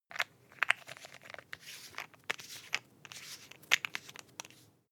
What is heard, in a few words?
toothpaste close rattle soft; haus-garten; badezimmer